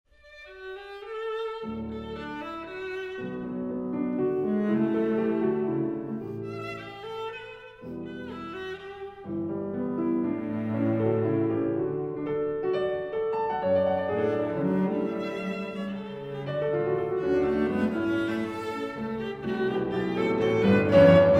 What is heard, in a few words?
piano trio; kiel